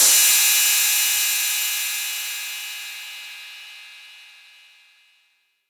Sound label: crash single hit f key, Royality free crash sound tuned to the F note. Loudest frequency: 8065Hz